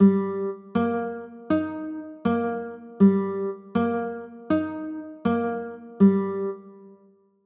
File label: e flat maj, chords